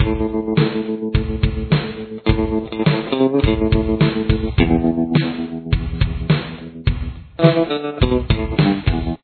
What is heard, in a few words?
verse part 3 guitar